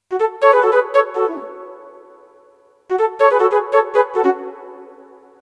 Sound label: recorder, Roland MT-32